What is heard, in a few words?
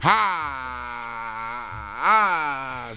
"haaaaaaahah"; ooh ahh